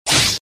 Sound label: Hit; Mighty Knight 2